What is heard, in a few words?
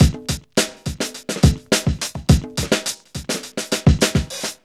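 105 bpm, Funky loops; Zero-G; Funky Elements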